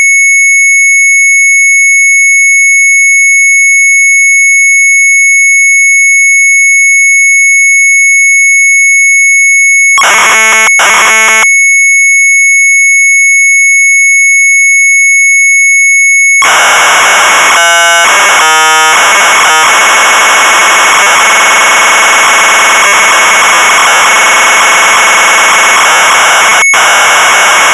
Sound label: MZ800 Tape, sharp; PRINTEN.RAP; retromelsarchive